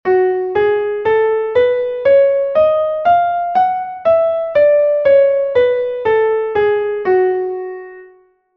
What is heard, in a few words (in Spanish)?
fa sostenido menor melodica